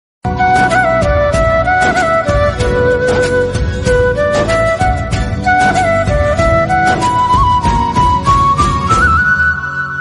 bgm music telugu love

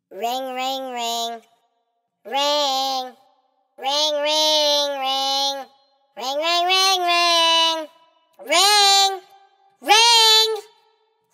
angry ring ring ring ringtone

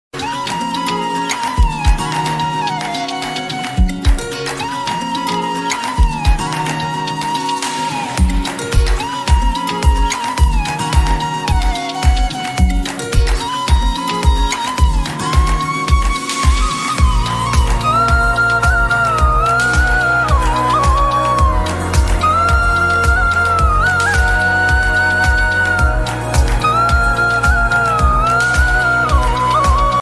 trailer bgm ringtone

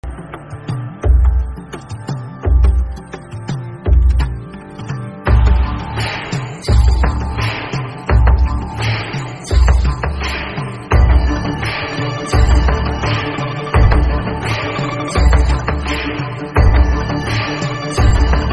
suspense bgm mass movie bgm; Itlu Maredumilli Prajaneekam

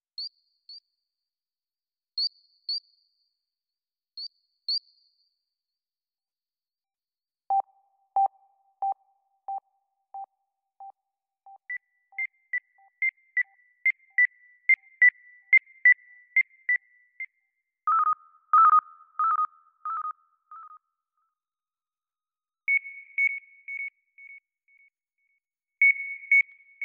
beep sounds ringtone

